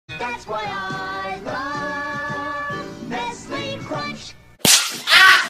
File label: Crunch Slap; Nestle Crunch